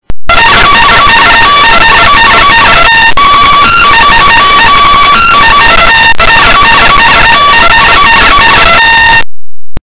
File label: Reveille